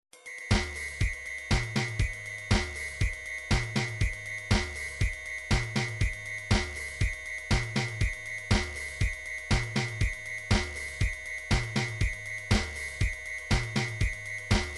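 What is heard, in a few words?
drums pattern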